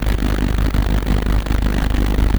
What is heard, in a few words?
ELECTRICITY Distorted Fizzy loop; loop mono; Universal Sound FX